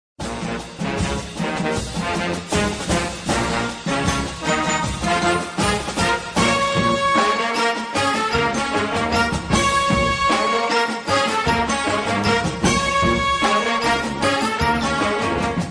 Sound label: marching band stand tune